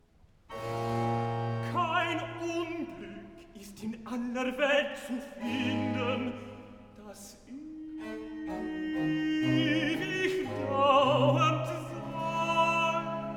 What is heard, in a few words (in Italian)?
Recitativo (Tenor); Cantata, BWV 20 'O Ewigkeit, du Donnerwort'; Bach; London; 1st Sun After Trinity